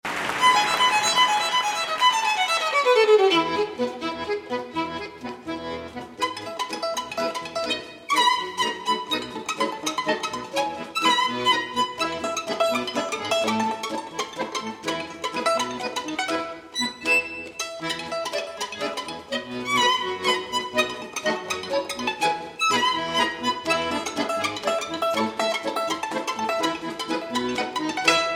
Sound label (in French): pizzicato valse